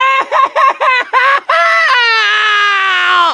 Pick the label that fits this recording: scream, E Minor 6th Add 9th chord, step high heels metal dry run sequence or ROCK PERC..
scream